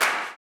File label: CLAP, blues & soul; ILIO; Vocal Planet